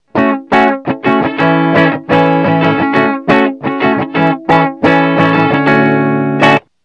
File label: clean